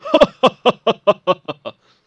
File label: Rival Laugh